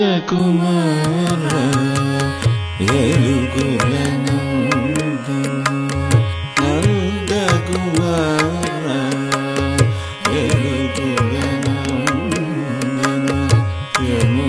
Ringtone; Nandakumara

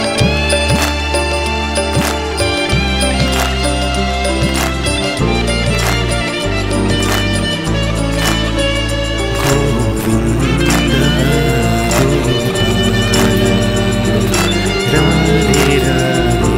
Music Ringtone; Radhe Radhe Shyam

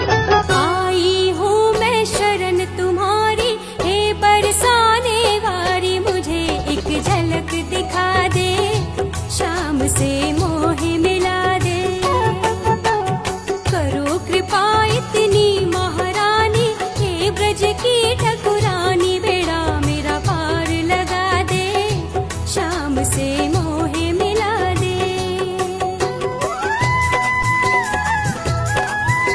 Ringtone

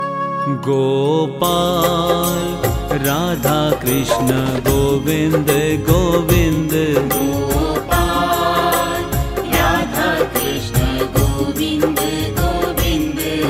Ringtone

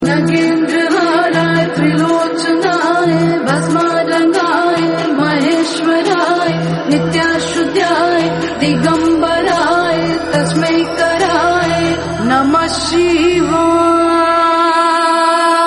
Namah Shivay Ringtone; shiv mantra

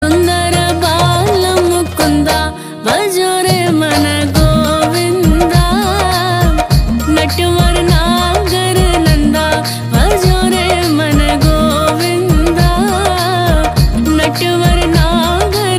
Krishna Ringtone

